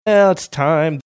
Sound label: singing now its time